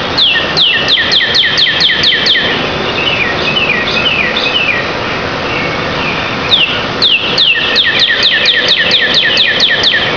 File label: birds